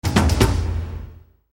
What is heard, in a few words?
Drum Trailer Musical Accent Impact; Trailers (Sound Effects:Sound Design)